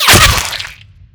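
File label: physical bullet impact flesh, violence; Benny Placeholders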